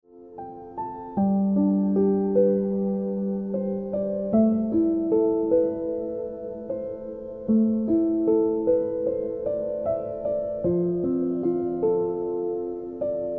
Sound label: Ringtone Piano Music